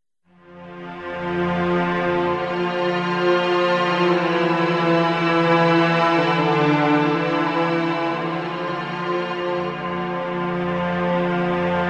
Strings

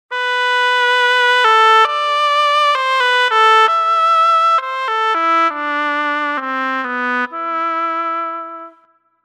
oboe; TURBO VL

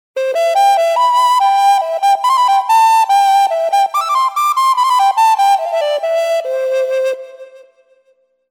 flutofon; TURBO VL

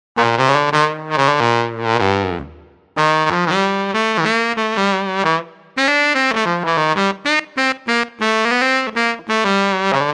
Tite Bari; TURBO VL

